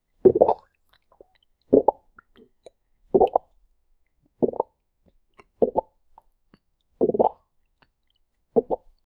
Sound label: natural human swallowing